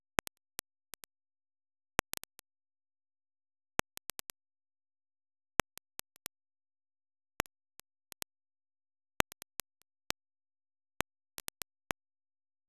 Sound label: Noise, LoFi Noise; DIS Noise TY4 - RND; Roland BK-5